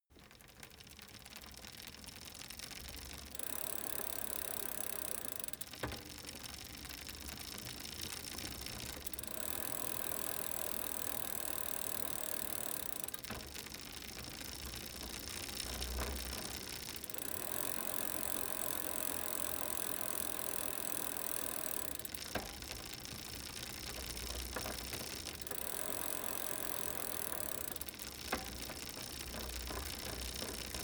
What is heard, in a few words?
bicycle street accelerate sequence; fahrrad; verkehr transport